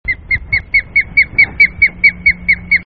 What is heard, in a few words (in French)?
Balbuzard, oiseaux; Le chant du Balbuzard; aigle pêcheur; Son Oiseaux; niche dans les arbres